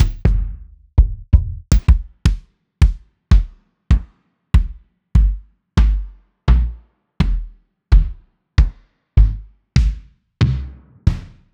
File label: Kicks Acoustic Kicks; ghosthack